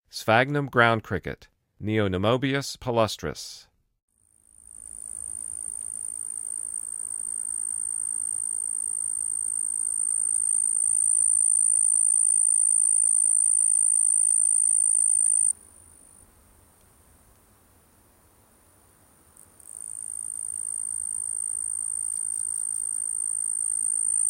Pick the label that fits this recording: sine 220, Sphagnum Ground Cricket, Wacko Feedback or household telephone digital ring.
Sphagnum Ground Cricket